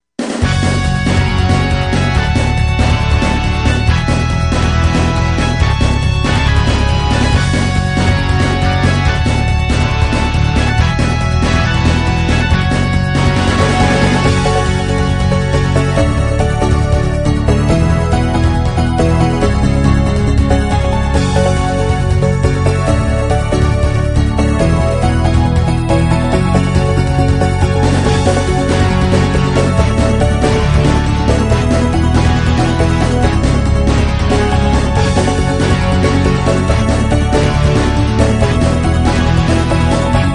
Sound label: Win; Nijikaku Mugen